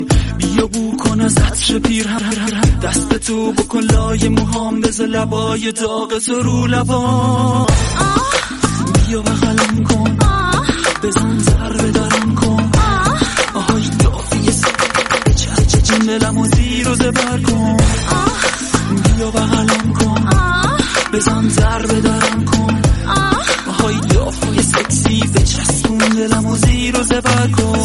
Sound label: irani song